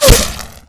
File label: bullet light ballistic kill shot human; Titanfall 2